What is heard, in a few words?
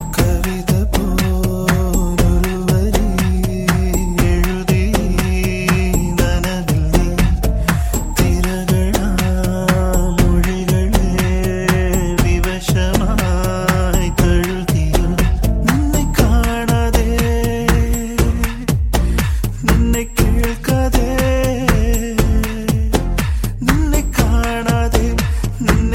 malayalam ringtone